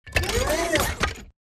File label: combat run to idle; Titanfall 2